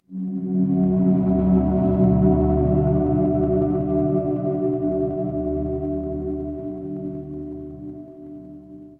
horror drone

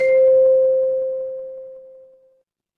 instrument chime bar

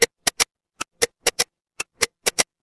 mechanical clock tick

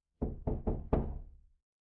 door knock

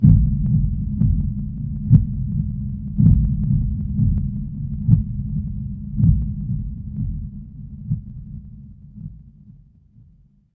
horror heartbeat

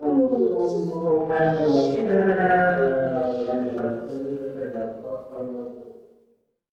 crowd murmur

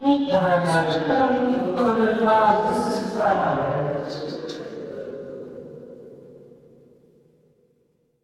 crowd murmur

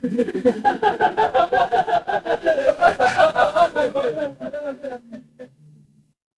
crowd laughter

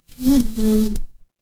animal insect

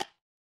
instrument wood block

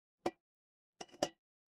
glass ceramic

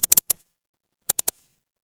office mouse click

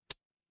ui toggle

